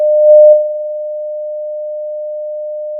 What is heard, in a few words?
pop, Sound of a school bell